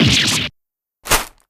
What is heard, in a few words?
damage